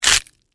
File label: Crunch Celery